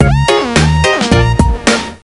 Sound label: Song Win; Girls Only; NDS